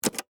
Telephone, Button, Push, Line, Transfer, Office, Phone; BlastWave